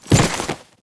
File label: die; BackShadow